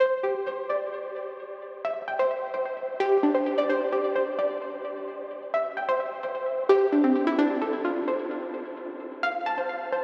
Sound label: Melody Lead Ambient Downtempo; midi wizard